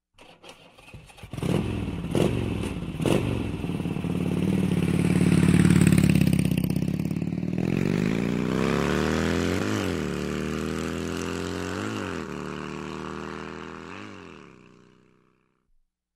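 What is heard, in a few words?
Motorcycle handle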